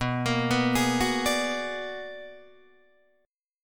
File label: B-Minor Major 13th B down Guitar DADGAD; arpeggiated